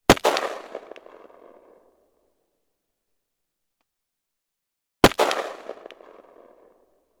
weapon gun ar 15; still north media

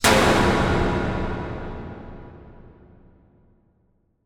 impact metal hit large reverb industrial

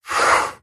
foley wood block small x1 slide wood table

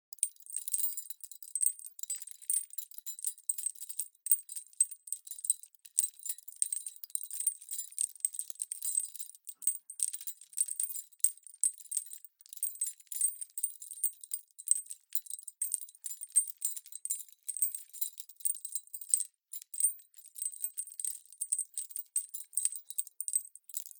Chains pull Hanging Jingle; Just Chains; soundbits

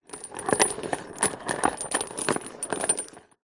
industrial chain medium sized movement on wood; zapsplat

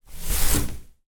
household net curtain movmement hand window

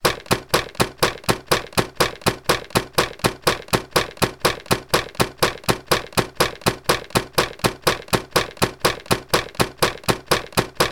science fiction robot run; sound effects one

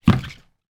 food banana bunch put down on worktop

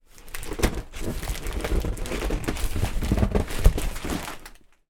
large cardboard box taped item handling; foley

